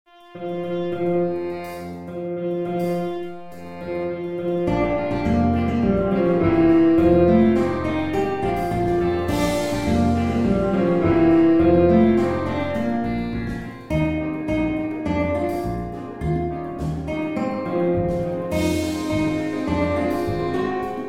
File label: jazz combo